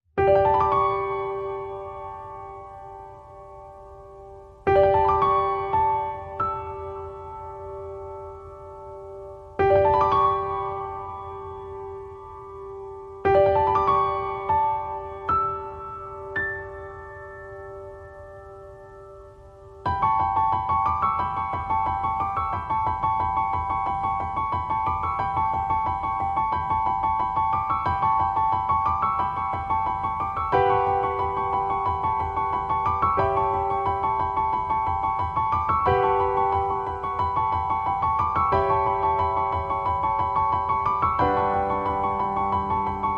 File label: Piano Only